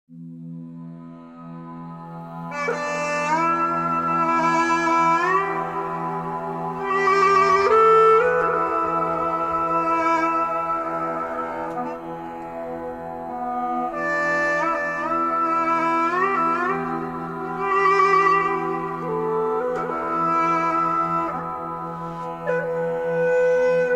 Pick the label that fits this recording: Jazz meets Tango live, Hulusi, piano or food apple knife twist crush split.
Hulusi